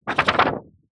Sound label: office paper construction sugar shake; zapsplat